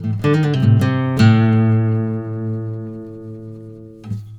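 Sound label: baritone lick A, Blues Guitar; ILIO; Fretworks; 108 BARI LICK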